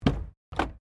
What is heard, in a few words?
HELICOPTER SIKORSKY UH60 BLACKHAWK HINGE DOOR OPEN; Helicopter Sound Effects